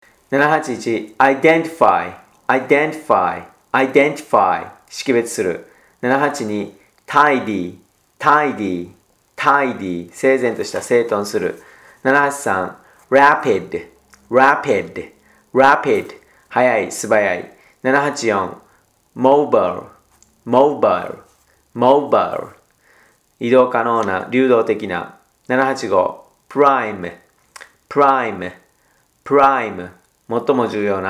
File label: tango